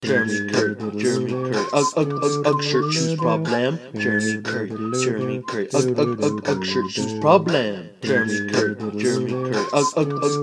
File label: acapella remix